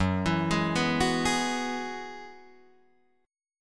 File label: Gb 9th Suspended 4th Gb, Guitar Drop D